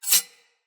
Sword Slice Accent; Weapons; Player; Garren Sword

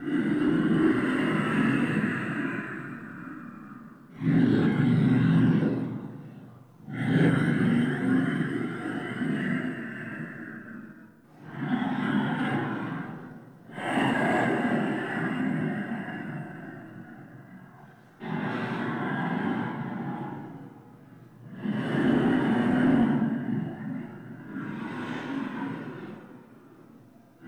Dormant Vessel Alternate Breathing Loop; Ambience; Props; Warframe; Entrati

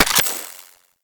Reload, Pistol; Grineer; Amphis; Weapons

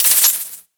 Dart Gun Mode Switch Poison; Quill

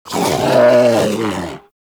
Predator Vocal Alerted; Warframe; Hunting Critters; Characters

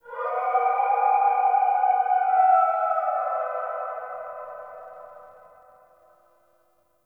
Forest Distant Creature Howl, Ambience; Warframe; Grineer

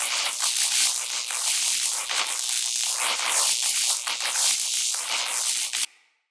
Shock Loop; Eximus

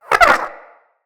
Wyrm Idle, Vocals

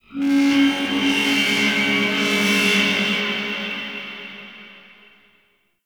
Modular Texture, Ambience; Warframe; Shadowgrapher

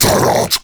Cast Vocal; Warframe; Uriel; Remedium

